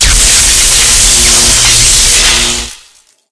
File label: zap; wheatley; Portal 2; Source Engine; video games; world